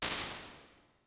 Vox Plate, Lexicon 480L Reverb, impulse responses; Big Gee's

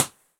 Glacier; Byron Glacier; Impulse Response; EchoThief Impulse Response Library

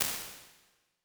Drum Plate, Lexicon 480L Reverb; impulse responses; Big Gee's

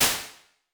Small & Bright, Reverb; impulse responses; Big Gee's

